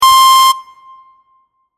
Beep Simple Reverb, A Simple Beep With Additional Reverb; Multimedia; Crafted Signals